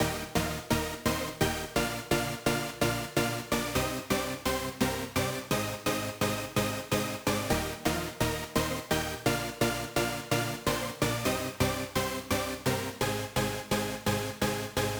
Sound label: lead, 128BPM